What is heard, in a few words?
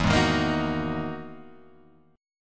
E-Major Sharp 11th E, Guitar Open G